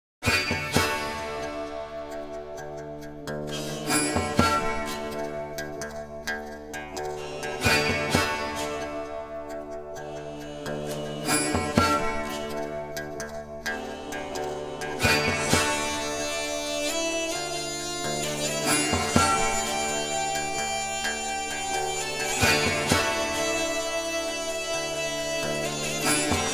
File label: Tavern Brawl; The Witcher 3 Wild Hunt; Toussaint; Minors